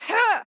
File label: Swipe Normal; Jackie; Going Under